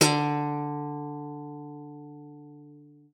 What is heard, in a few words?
D3, GUITAR; Xsample VOL-2